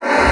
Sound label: AIR, BASSOON; Xsample VOL-6